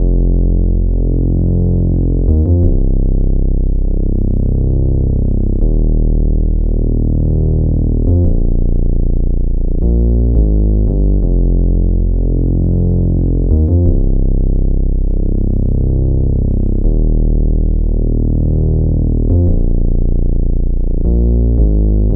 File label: Bass